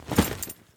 Foley Soldier Gear Equipment Metal Cloth Heavy Movement Light